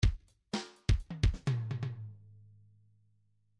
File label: fills pop fill